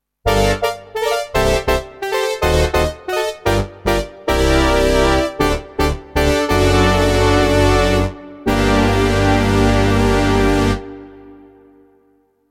TX7 Fatsynth

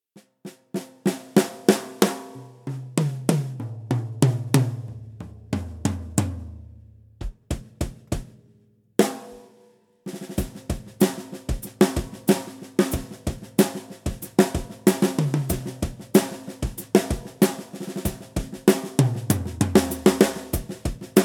Millenium Studio Kit FX

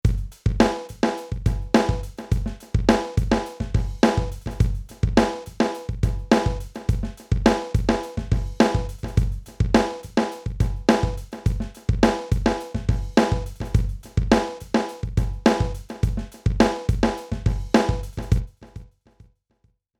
Drums Funk

